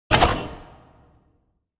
Attack Magic Arrow; Game Essentials

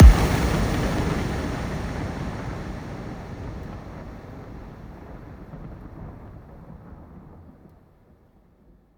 Impact; Trap and Dubstep Samples 2018

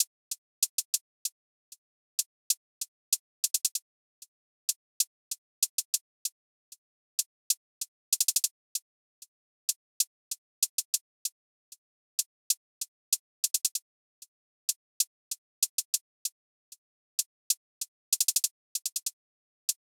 Drum Loop, 96 BPM Hihat; Loop Stems; Cymatics; Vibes II Drum Loop Collection; Forgotten Drum Loop

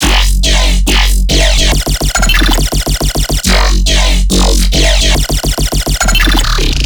Riddim Bass Loop F# 140; Hoverboard; Ghosthack; Riddim Essentials Volume 2

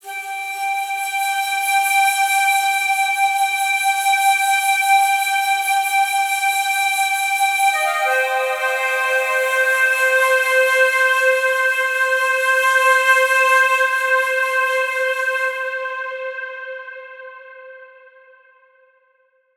Flute - Cm 60Bpm; Construction Kits; STEMS; Ghosthack; SkyRealm; Kit 06 - Before The Storm

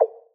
Percussion (Underwater), Hip Hop; Drum One Shots

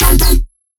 Bass E Back and Forth; Dubstep; Bass One Shots; Ghosthack; Dubstep Sound Arsenal

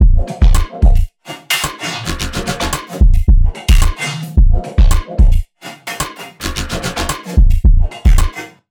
Drum Loop - 110 BPM, Experimental

